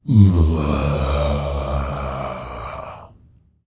Dino Groan

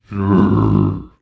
Dino Groan

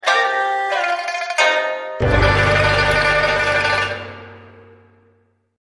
bgm great wall lose

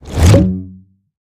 Pilot fall

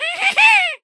Laughing; Caesar

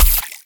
peashooter pea cannon core stereo

